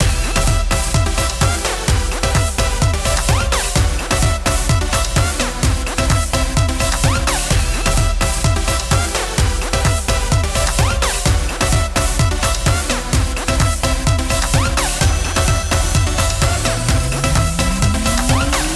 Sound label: music ending; Equalizer